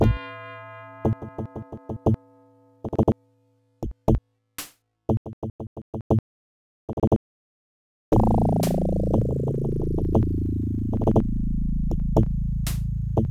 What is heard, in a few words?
pc suspense; wolfenstein 3d